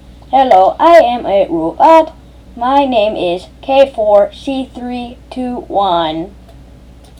duuuh

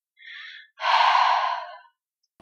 sigh

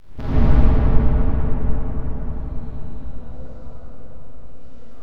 creepy explosion; Creepy Sounds

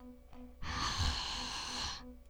sigh

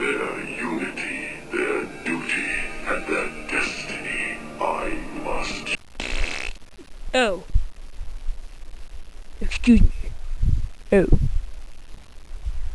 fart; makuta; bionicles

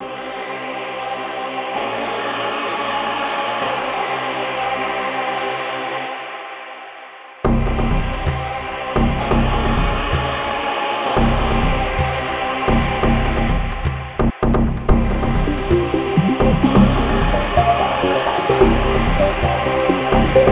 fight background

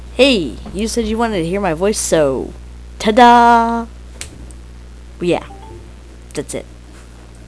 buahahaa

